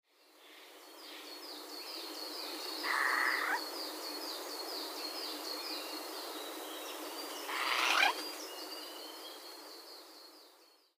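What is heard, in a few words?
kakesu, Eurasian Jay; chichibu; cyberforest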